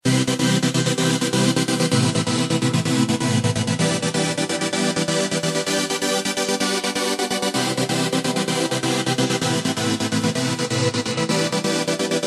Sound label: Funky Beats